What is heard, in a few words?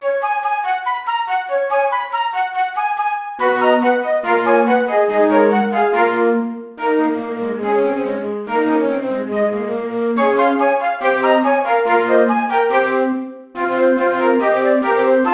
Flute; The Bard's Tale Remastered Trilogy